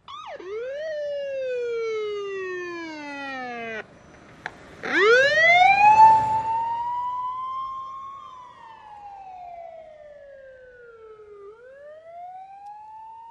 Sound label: Police Wailer Siren In And Off, Then Siren On Close; By And Away To Distant.